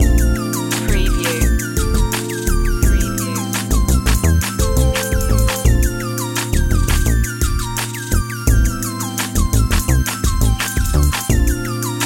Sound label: trap miller main loop